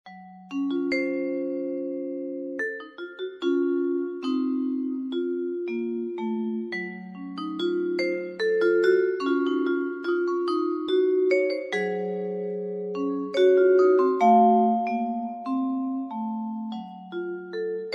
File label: Ringing Webs